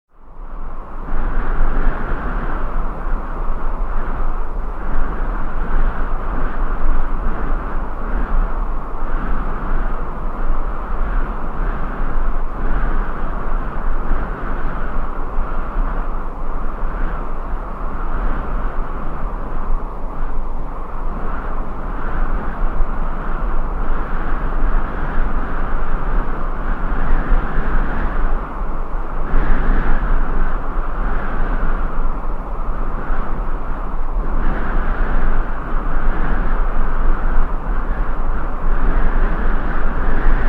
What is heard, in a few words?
wind; smbx38a; The Great SMBX 38A Contest II; boss